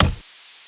Hack; PC Gamer IT CD 48; 1602 AD; 1602ad demo

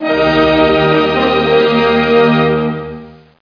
orchest g, klang

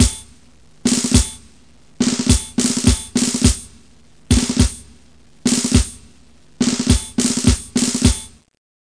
marsch, drums; 22khz; Ultimedia

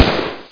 gewehr; cannon hills

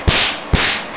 Peitsche

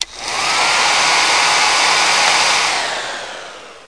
Foen

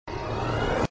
ansteigen

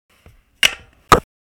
holz hack

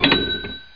zaehler

